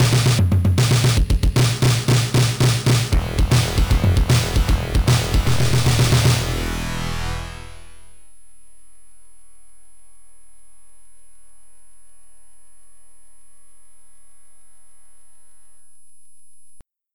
Swamp (Game Over); N.A.R.C.; arcade

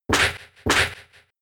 Double Hit; attack moves; Pokemon; Gen 4; DPPL HG SS

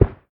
Carpet

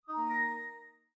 Quirky Print complete, windows 7

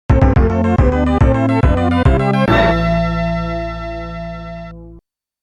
A COMBAT IS OVER. (GAME OVER); R-Type Original Sound Box

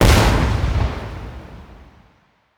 Fireworks Large Explode; angry birds rio

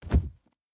Hiding Spot Enter Body; The Inn

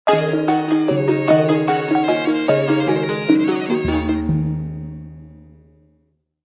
China Music; Civilization Revolution; Xbox

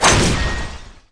SFX Swing; Cobra

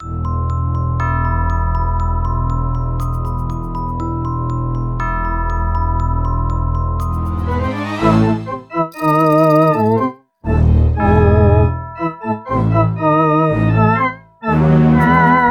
Ghost House Fast; New Super Mario Bros. U Deluxe

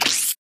Propeller hat Collect; doodle jump 2; android; Propellerhat Walkman Collect

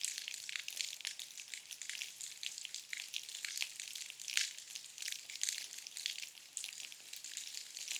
water dripping loop; adams venture origins

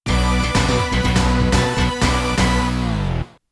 Fanfares; Sailor Moon S; 3DO

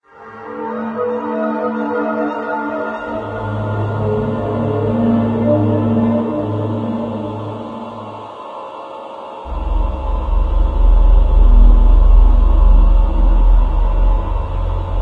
Monorail Intro Music; Doom 3

